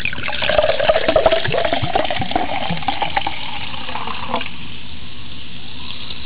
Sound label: pour soda; Motorola MPX200